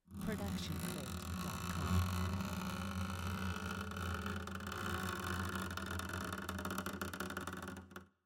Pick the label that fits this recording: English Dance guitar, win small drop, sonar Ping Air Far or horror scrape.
horror scrape